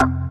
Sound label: DM ORG1, Dance Mega Synth